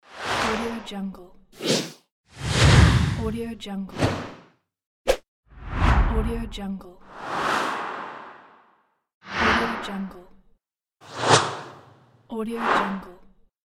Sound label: swooshes